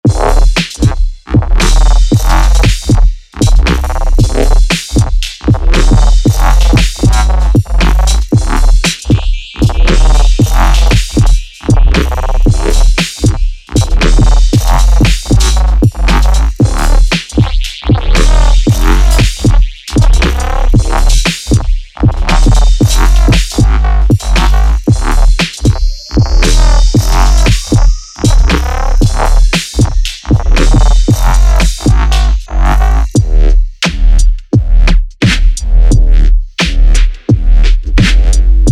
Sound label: weird groove heavy drop; weeklybeats 2024